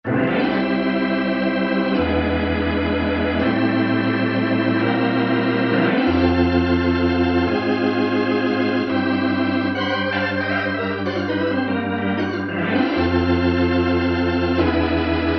9 Bars - Fast Leslie - Medium Distortion, Rock Keyboards; Greytsounds Philip Wolfe's Rock Keyboards